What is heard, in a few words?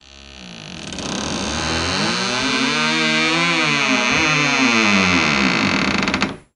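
Closet Door Long Creak; hinges & creaks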